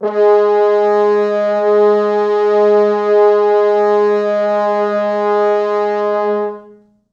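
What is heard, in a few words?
French horns sustain G#2; East West; Quantum Leap Horns Sax; 4 FrHorns SUS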